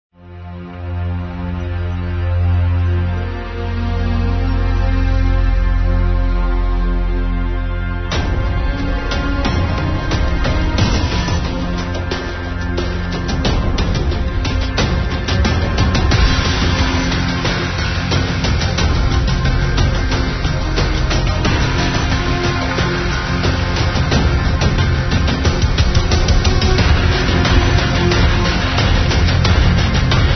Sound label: intense cinematic